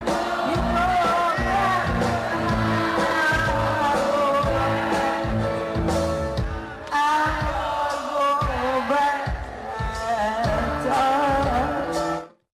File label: poor singing